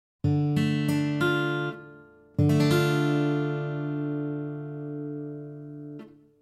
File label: D Minor Basic Guitar Chord